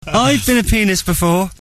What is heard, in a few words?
pianist